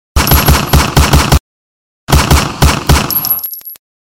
gun sound

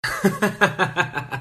hahahahahaha

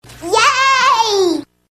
yay

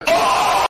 man screaming

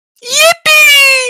yippeee

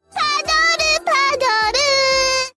padoru

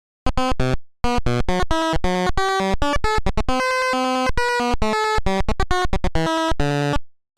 ringtone; sprunked garnold

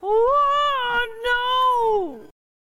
ohhh no

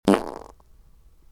wet fart

